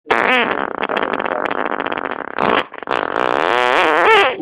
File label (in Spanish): Pedo de pueblo